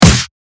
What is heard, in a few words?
snare